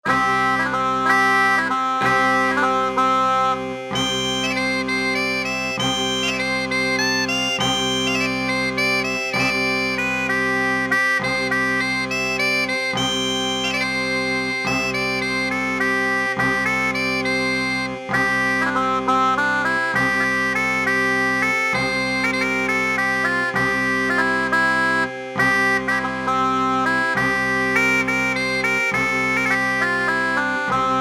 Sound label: Bag Pipe, Roland SC-50 Sound Canvas; Factory sounds